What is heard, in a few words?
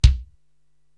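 bass drum Dry, Korg TR-Rack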